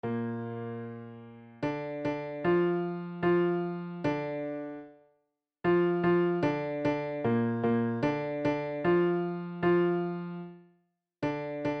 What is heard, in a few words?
major triad; intervals